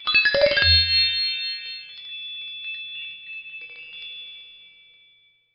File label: Chime